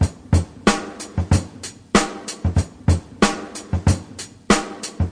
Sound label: 94 some noisy oldschool funk, drum loops and breaks; 091 to 100 bpm